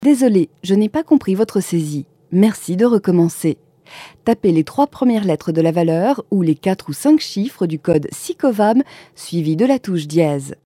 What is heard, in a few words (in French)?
classique